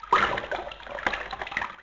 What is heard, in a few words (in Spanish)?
desangre